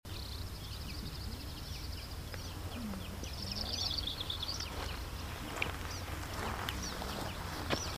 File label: humming